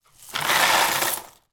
food cereal frosted flakes tip back into box from bowl